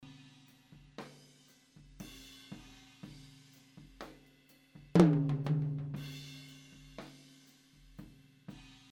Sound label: Tom High